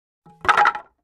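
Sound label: 2x4 Several Planks Dropped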